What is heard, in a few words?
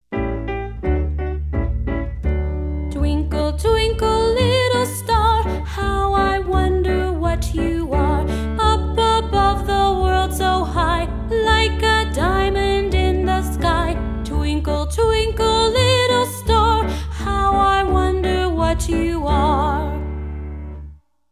twinkle twinkle little star